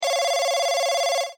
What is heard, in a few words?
Standard ring; Lenovo; A630